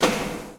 Servo Large Start

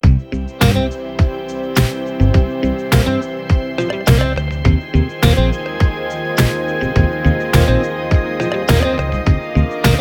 Ambient Funk Edge Loop; headspun

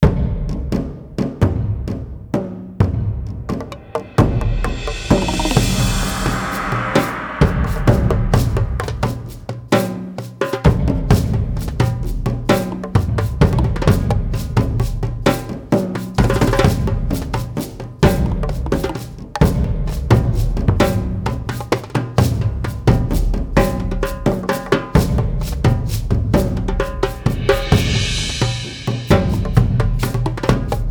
Perc Egyptians

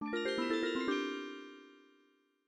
MIDI Animation stings

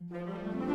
Deception Start 50bpm; asterix obelix slap them all 2; Music Dialogue

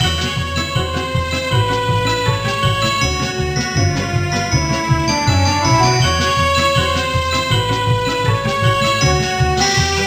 Flitting; The Simpsons Cartoon Studio

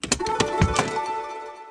Chest Open; luna monsters tower defense the deprived magical kingdom

